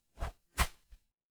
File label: paper fabric cloth whip